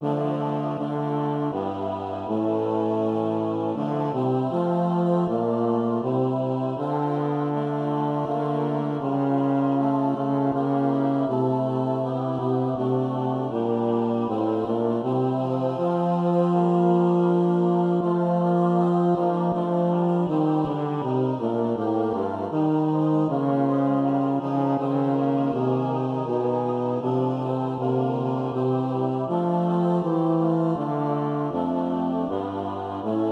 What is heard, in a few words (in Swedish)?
bas; Bethlehem down; julotta